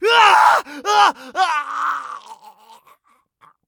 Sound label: agony; Escape from Tarkov; scav4